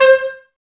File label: WND CLR, Clarinets; Miroslav Vitous - Woodwind Brass Ensemble; 90's Sample CDs